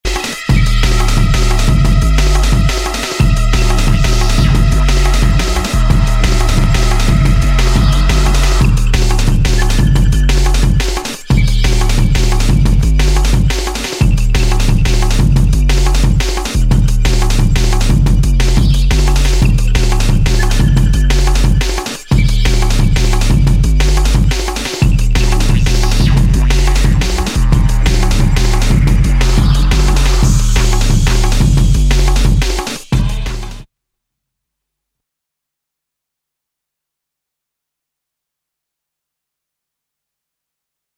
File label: dnb; 2000 era